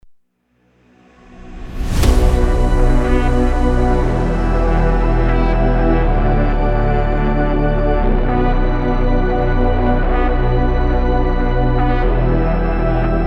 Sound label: melodic techno